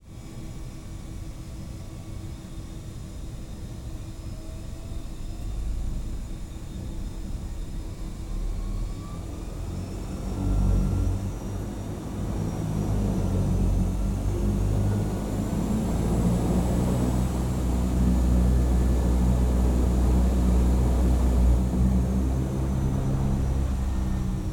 Plane, Seaplane, Ride POV, Cabin, Maneuver, Swing Around